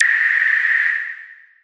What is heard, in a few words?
longpole, Electronic Hits